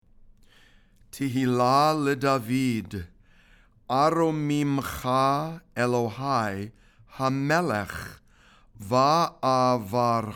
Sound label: ashrei read and sung